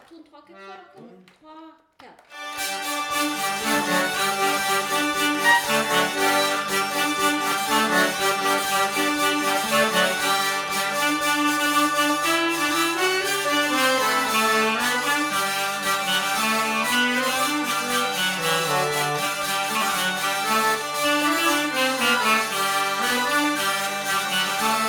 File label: polka